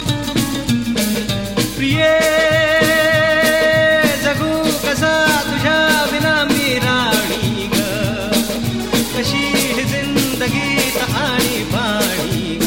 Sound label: marathi special priy tune